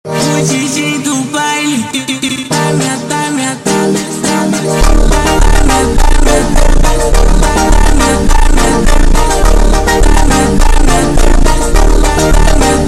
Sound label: slowed